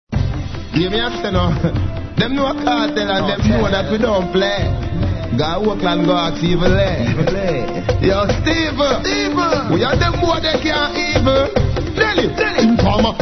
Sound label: riddim